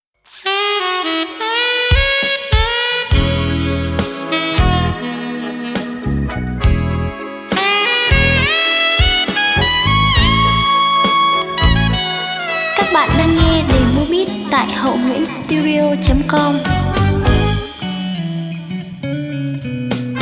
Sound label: beat, playback